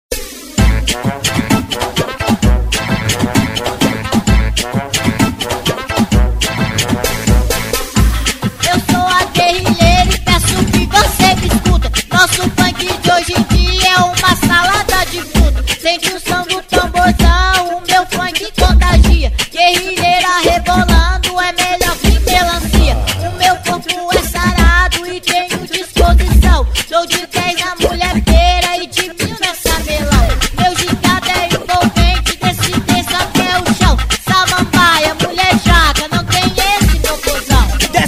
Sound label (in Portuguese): funk